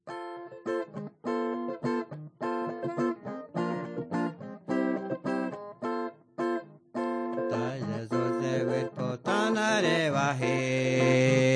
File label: singing to god